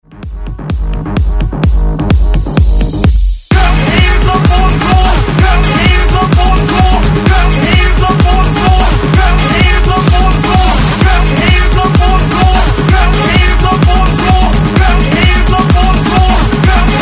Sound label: 128Bpm; DJDOLLS